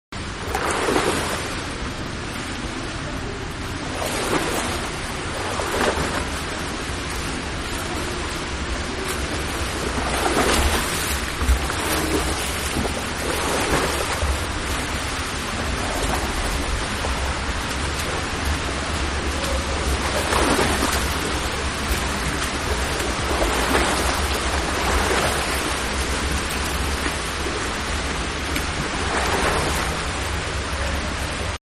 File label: Swimming Diving Strokes slow, Swimming Indoors-Strokes-Slowly And Quiet; Miscellaneous Sport; Sports; Manhattan Production Music